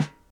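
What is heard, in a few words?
snare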